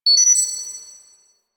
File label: UI Correct; Warframe; Quests; The New War; Zariman Class Quiz